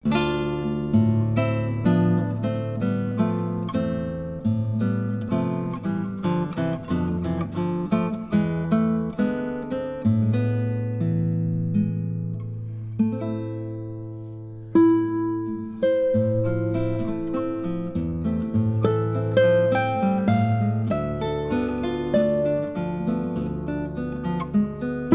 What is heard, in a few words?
Sentimental Melody